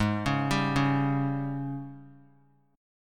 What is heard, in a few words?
Ab Major 7th Suspended 4th Ab down 3C 3F 3A 3D; arpeggiated; JGuitar